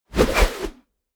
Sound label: whoosh air